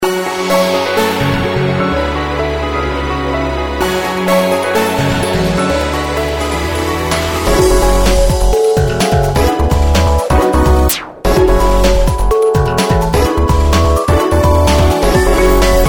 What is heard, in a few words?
Fugue in G minor; LG; Timing and Space